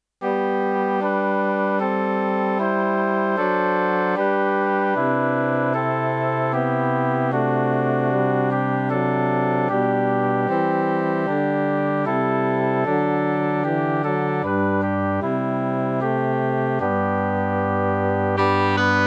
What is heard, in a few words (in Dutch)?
Tutti midiklank